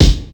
Woo Box Kick

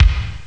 bass drum; Amiga Soundtracker Sample Packs

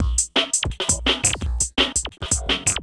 Bug beat, Synchro Funk, Beats; Sonic Foundry (Sony Creative Software)

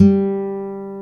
G3, ACSTC.G; AKAI S-Series CD-ROM Sound Library VOL-2

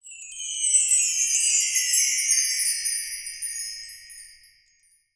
ALUMINU, TREE BELLS; Voice Crystal - Steve Reid Definitive Percussion

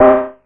SYN, Drum & Bass, 160 BPM

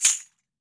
JINGLE CLAP, JINGLE BELL; AKAI S-Series CD-ROM Sound Library VOL-5

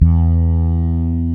Fretless Bass

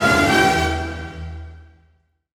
HIT, orchestral; Orchestral Loops; FULL ORCH ACNT

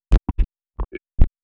glitch thing

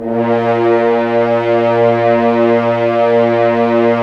Horns; Roland - LA Composer vol. 4

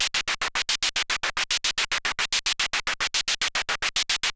MiniBrute 110BPM, Gated Seq Noise

